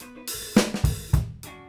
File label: FILL